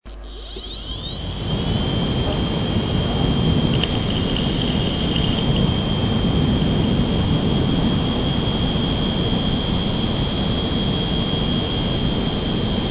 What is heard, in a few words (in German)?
festplatten headcrash